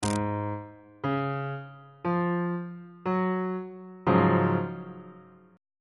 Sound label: f sharp major 7th suspended 2nd 1st inversion on bass clef